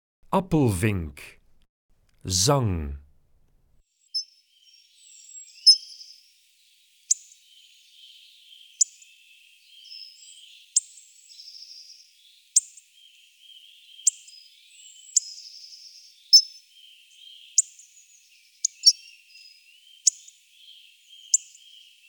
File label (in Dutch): Appelvink, Zangvogels; IVN